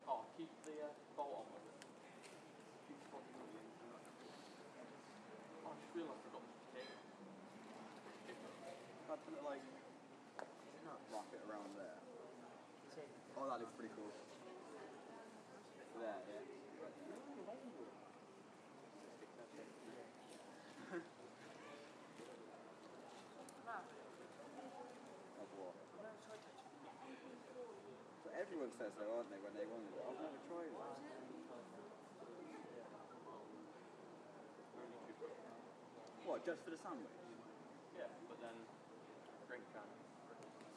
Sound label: sunday afternoon ambience at worcester foregate street railway station platform